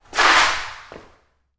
foley footsteps skid feet hard screed floor reverberant corridor